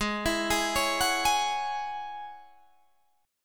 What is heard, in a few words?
G sharp 7th Suspended 4th Sharp 5th G sharp Guitar Standard; G#7sus4#5 arpeggiated; 16,19,18,18,19,16; JGuitar